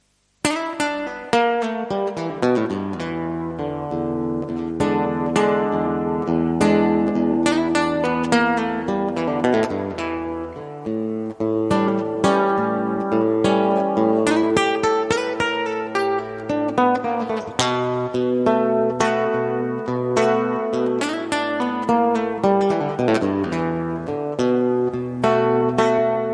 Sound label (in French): Ma Guitare Blues